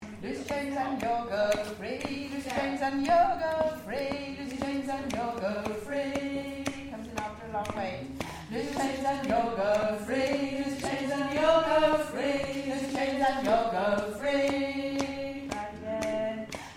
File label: tenor